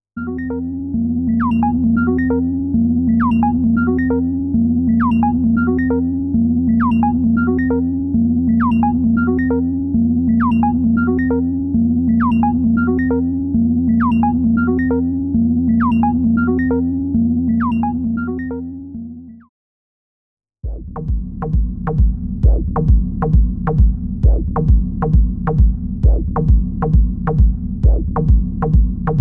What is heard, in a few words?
Locked Grooves